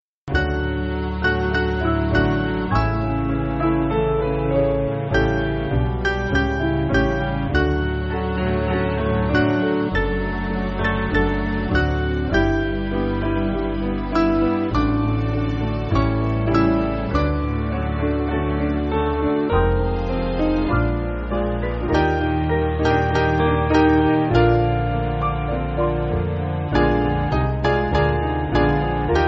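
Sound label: Band, Music Backing Files for Churches; Hear Our Prayer; Whelpton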